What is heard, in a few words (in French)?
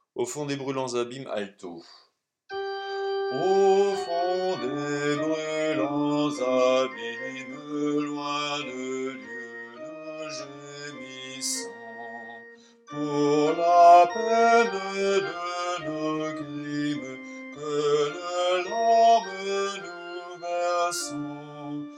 alto